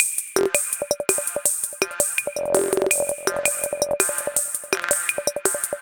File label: C78 165 Break, Drum Machines; Bender; Ableton